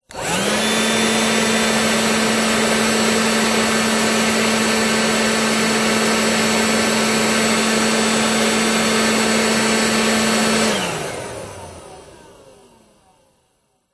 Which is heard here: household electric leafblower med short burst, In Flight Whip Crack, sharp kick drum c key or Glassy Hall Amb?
household electric leafblower med short burst